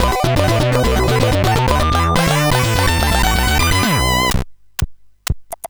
sid CSG8580R5

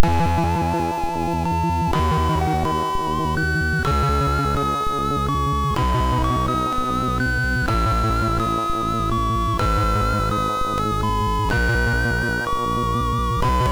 sid MOS6581R2

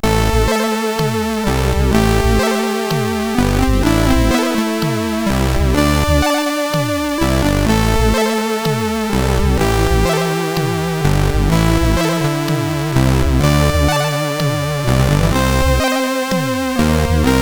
Short Tune, CSG8580; sid; CSG8580R5

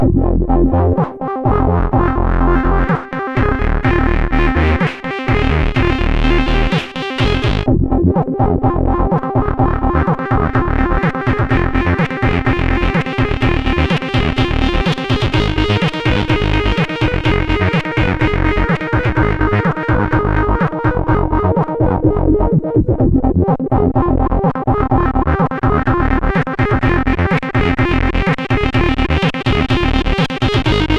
sid CSG8580R5

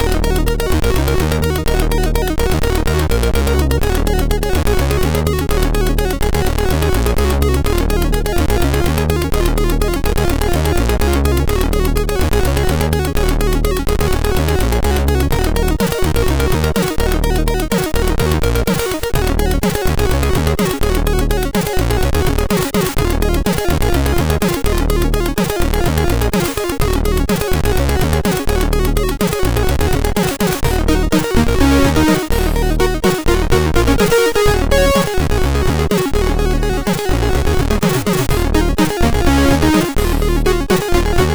sid MOS6581R2

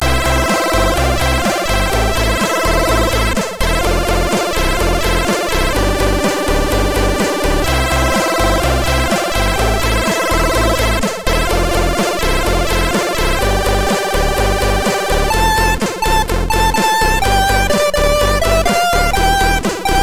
Shape Music, sid MOS6581R2

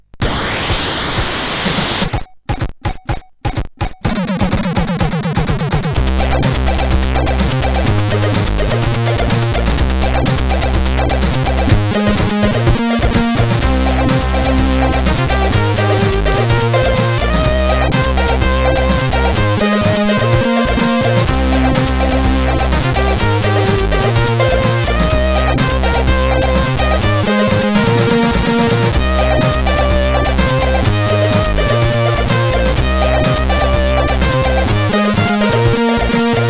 Shape Intro Tune, CSG8580R5

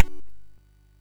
MOS6581R2, sid; Defenders of the Earth

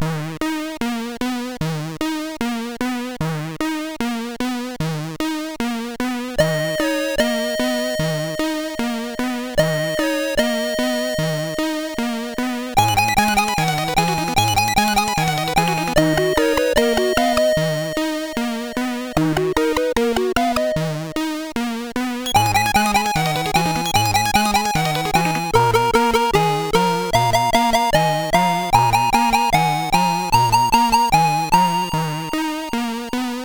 sid CSG8580R5; Bruce Lee II Preview

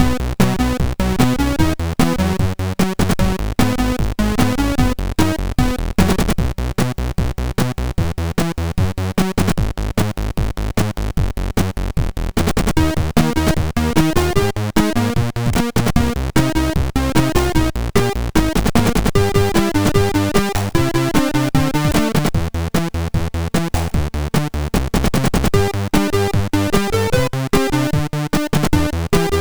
sid MOS6581R4; Paul Kubiszyn; Latest Tuney

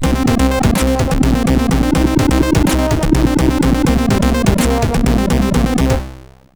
Unused Shmup Ready Game Over; CSG8580R5

